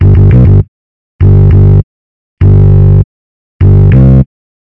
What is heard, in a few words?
rythm